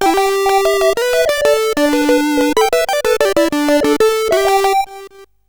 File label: Game Music, sid CSG8580R5